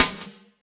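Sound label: snare drum, Drum & Bass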